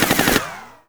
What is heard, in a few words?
Impact Pow